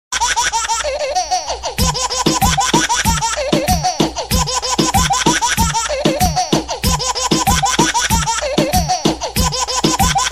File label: Baby Remix